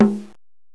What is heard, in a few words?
TAMBUR; Tamburello